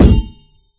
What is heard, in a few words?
metal canister impact soft, physics metal; source engine; left 4 dead 2